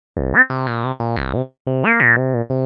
180 monosynth-voxy-meody, synth and electronic loops